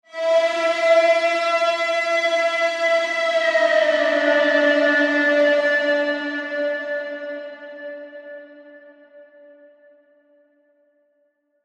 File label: ATMOS, Cinematic Sound Effects; 99Sounds